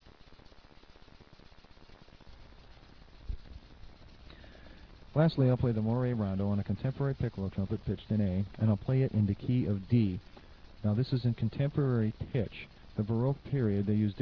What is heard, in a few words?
piccolo, brass wind